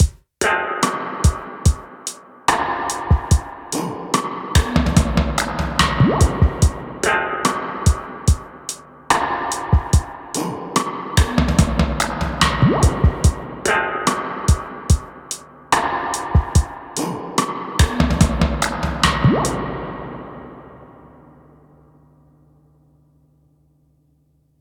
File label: test springs